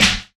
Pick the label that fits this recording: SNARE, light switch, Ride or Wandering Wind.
SNARE